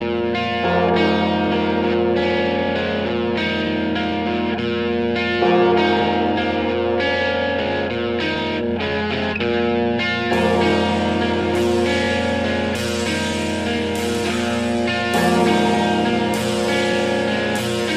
Apple ringetone

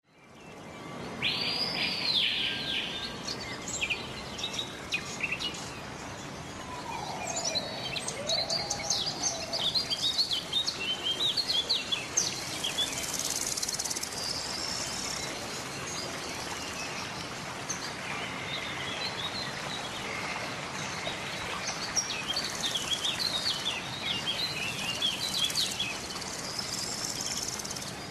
natur, Natur ringetone

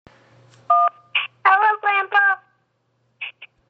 ups telefon

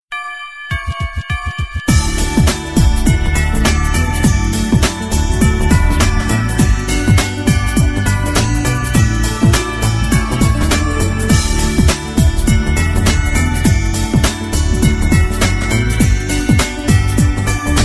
juletid

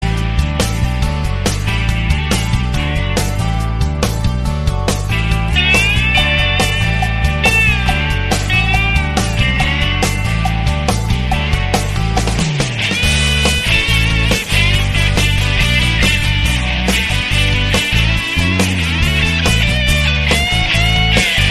Samsung Galaxy S22 ringetone

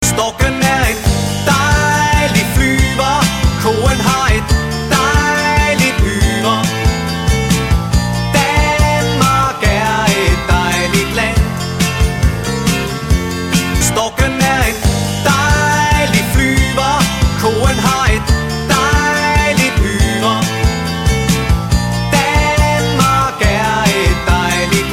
dejligt land dansk, ringetone

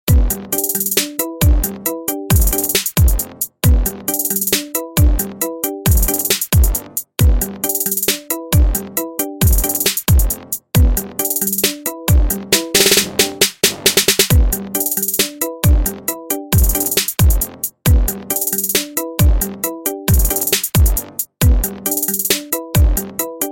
iPhone Melodier Remix ringetone

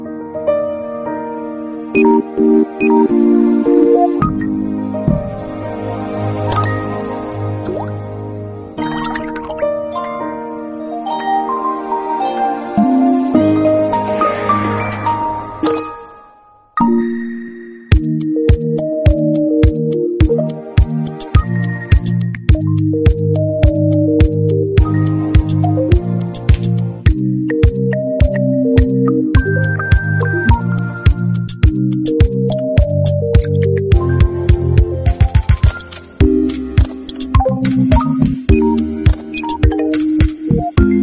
nyt originalt remix fra samsung, ringetone; mobiltelefon

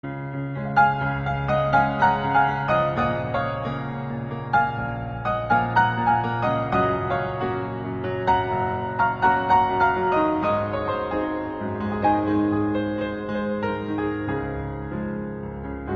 jerusalem klaver, ringetone; mobiltelefon

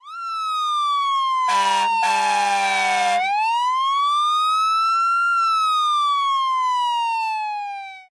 Ambulance ringetone

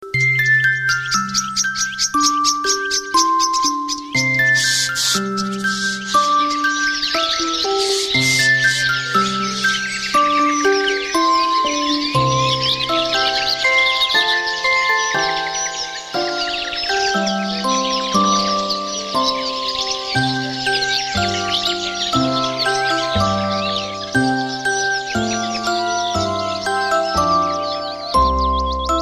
magisk alarm slap af

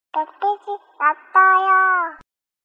meddelelser kakaotalk, ringetone

